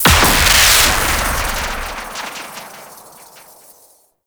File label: Electrify Cable Impact; Warframe; Teralyst; Ropalolyst